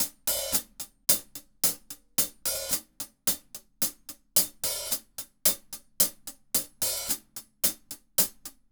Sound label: Hi-Hat Groove